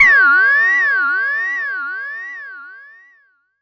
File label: Misc Synth FX; musicradar; cartoon caper